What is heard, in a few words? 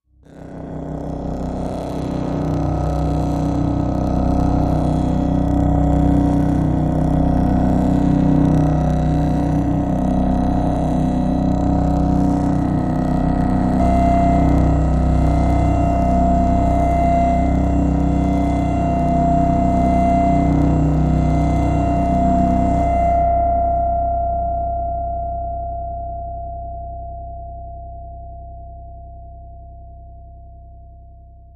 Machine, Low Pulsing, Electric, Polish, Ambient Buzz; power core hum; Judgment Buffer